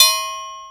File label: bicycle, Percussion Ticks; Misc Percussion